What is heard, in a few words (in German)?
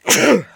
Niesen